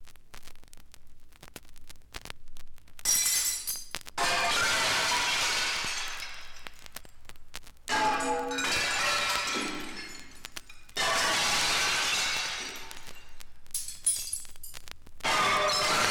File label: Glass Breaking